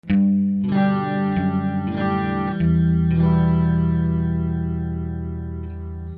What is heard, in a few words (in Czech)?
kracejici bas, kytara